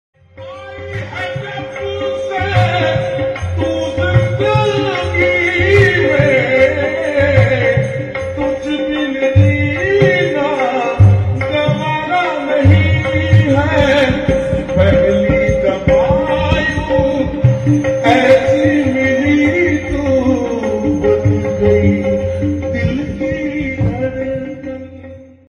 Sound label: Slowed Reverb Ringtone